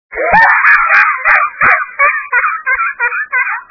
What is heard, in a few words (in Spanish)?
carcajada ruidosa